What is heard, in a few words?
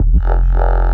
Reese Bass F; Antidote Advent